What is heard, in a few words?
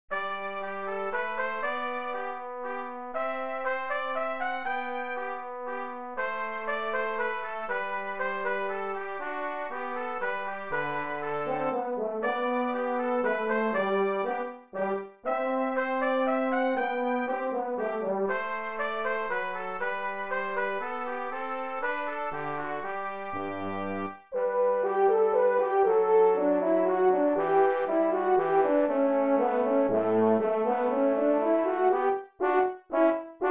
minuet